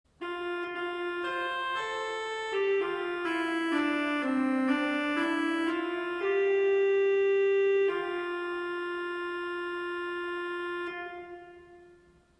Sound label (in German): Trompette 8